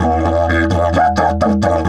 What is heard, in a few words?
E 130, didgeridoo; Big Fish Audio - Didgeridoo and Other Primitive Instruments; 130BPM